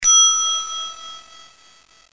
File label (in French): Cloche d'hotel